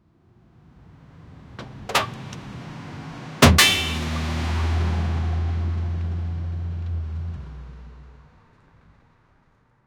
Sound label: the car crashed in the